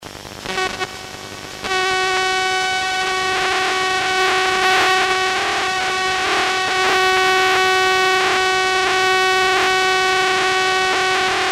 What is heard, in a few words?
subway arrival inside